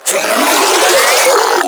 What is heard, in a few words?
Mid Spin Scream; Warframe; Proto Infested; Ancient; Abilities